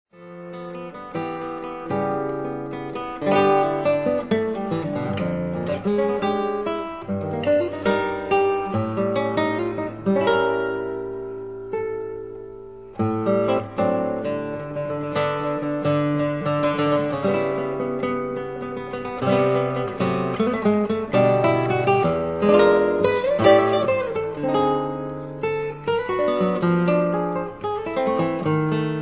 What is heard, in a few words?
Studies for guitar Estudo; No. 9